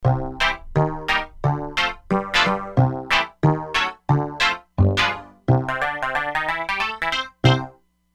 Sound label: Juicy Pizz, E-mu Proteus-3; Factory patches